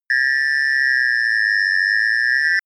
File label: high constant ringing; energie